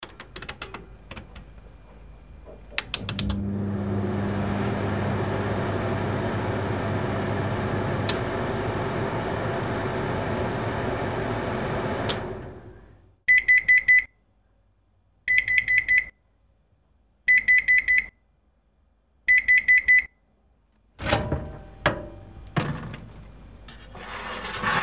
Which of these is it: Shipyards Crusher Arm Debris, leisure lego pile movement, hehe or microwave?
microwave